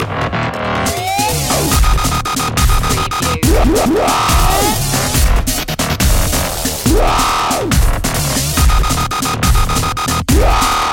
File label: dubstep loop